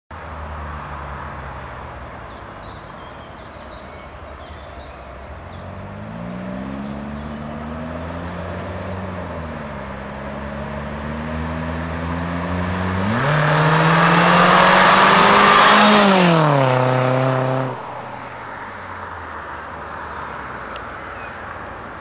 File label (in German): Sound Vollgas Vorbeifahrt